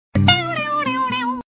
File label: Sms Tone